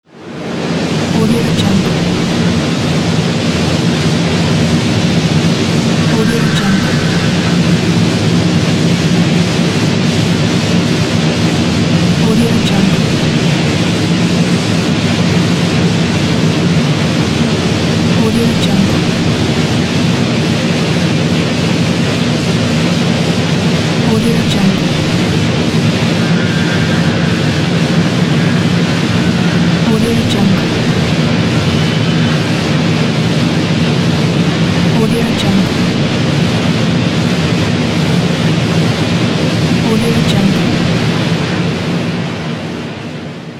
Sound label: mine general ambience